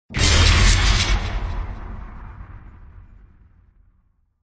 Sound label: Broken Glass Trails; Gothic Storm Music